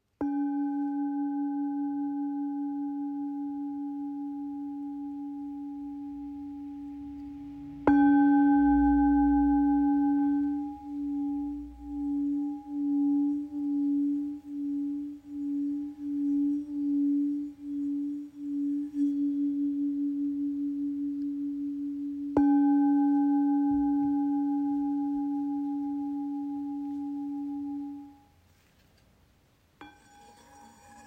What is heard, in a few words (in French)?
304gr 128mm 284htz do; PATAN